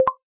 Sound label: Bricks Pick New Ball